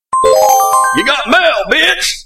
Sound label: Funny Mail Sms Tone